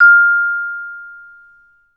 VB LONG F5, Advanced Orchestra; Best Service

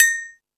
Dance 2000, Drum Machines E-MU Pro-Cussion

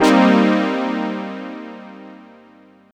Brass In Their Eyes Eb, Pads; Total Drum&Bass; 90 s Sample CDs

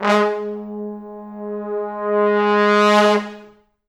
G#2, Pop Brass; Best Service ProSamples vol.19; AKAI

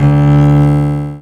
bsa g4, AKAI S-612 + S-900 Contra Bass; Retro Sampler

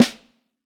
Vintage Snare Drum; Q Up Arts; Johnny.C's Vintage Snare Drum; 4X14 JCBOB

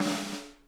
VINT KITS; Sonic Reality - Vintage Timetraveler

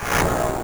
Sweep FX; Sonic 3; Ice Cap; M8; Genesis

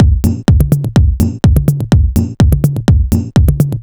A# 125bpm, House Drumloops; 90 s Sample CDs; Zero-G House Fabrik Drumloops; ZG Drumloops

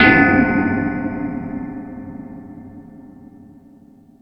Multi FX

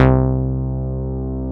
DANCE BASS; SYNBASS

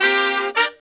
BRAZ LICK, BRASS LICKS

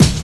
HARD HIP K, HIP-HOP; Drums In Your FACE!

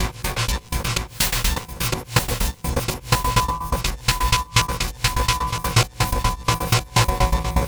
perc 125 data transfer, Percussive Loops 125 bpm; Sample Magic - Transmission-X

